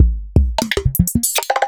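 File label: Roland MV8800 drum NU TR KIT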